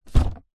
foley cardboard box parcel wrapped paper set down thud